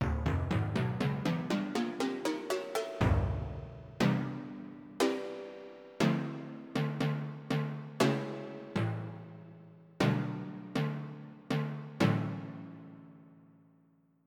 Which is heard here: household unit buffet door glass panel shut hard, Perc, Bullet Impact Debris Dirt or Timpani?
Timpani